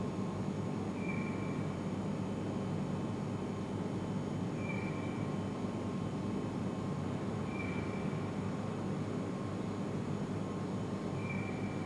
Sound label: abandoned mall; sf2e; murder in metal city